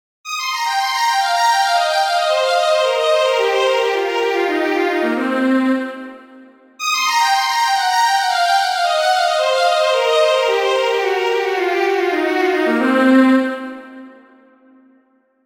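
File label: Minor Pentatonic, C minor blues scale; Treble ClefC4Eb4F4F#4G4Bb4C5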